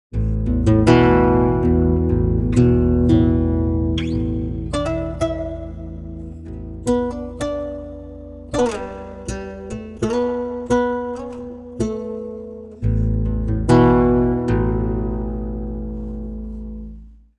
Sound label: guitar, pitchshift; prosoniq time factory dirac